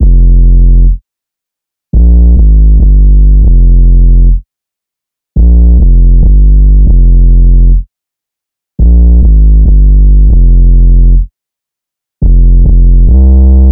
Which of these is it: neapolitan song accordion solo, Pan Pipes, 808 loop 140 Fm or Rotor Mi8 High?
808 loop 140 Fm